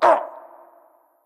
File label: hitreact human male small; smite; npc; roman archer